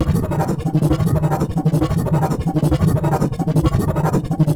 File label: Boost Gravity Well Loop; Gameplay; Warframe; Nineteeen Ninety Nine; PvPvE